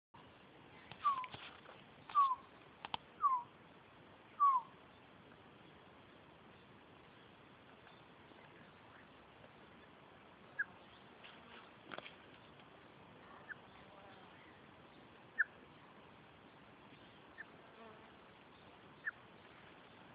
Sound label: Quetzal Call